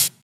Closed Hihat, Cymbals; Closed Hihats

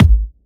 Hard Kick; Vengeance Samples

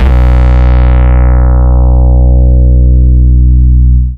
Root B, Ultimate Bass; Vengeance Samples

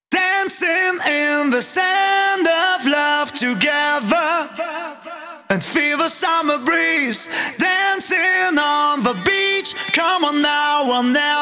Male Vocals WET, 128 BPM; Vengeance Samples; Vocal-Hook Kits; Song Kit

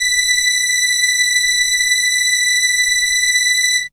Violin B6, ARP Solina, synth; musicradar-synth-samples

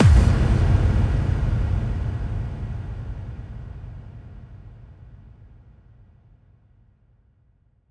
bassdrum reverb FX kick; Vengeance Samples

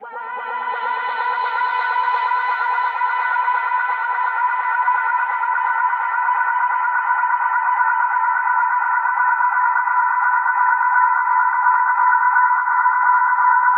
Reverb Athmospheres, FX Vocals; Vengeance Samples

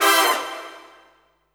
Synths C; Vengeance Samples

